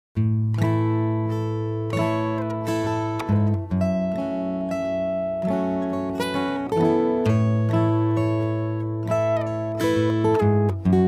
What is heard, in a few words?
Sweet Loop